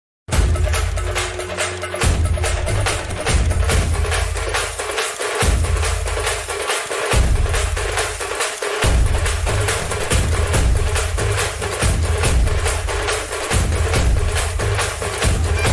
drums bgm; raavanan; chiyaan vikram; aishwarya rai; prithviraj; mani rathnam

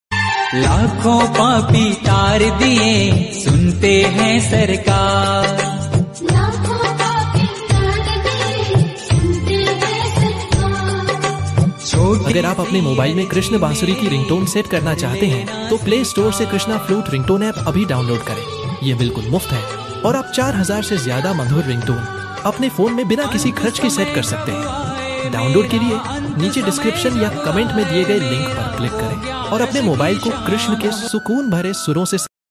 krishna ringtone bhakti ringtone; trending krishna ringtone

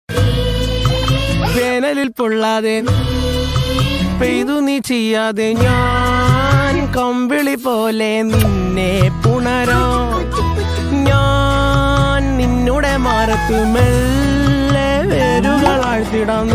song ringtone malayalam ringtone

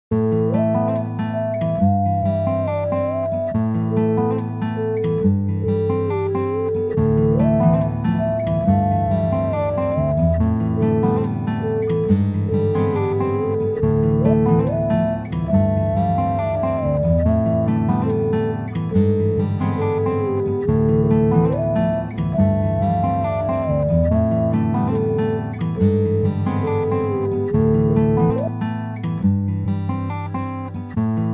guitar tone guitar romantic ringtone

